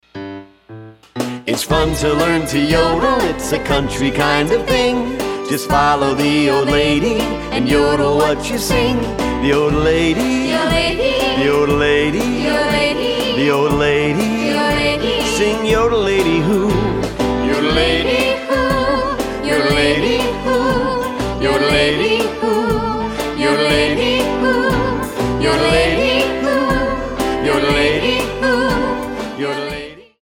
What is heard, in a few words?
Yodel; kids country